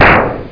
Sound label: tonk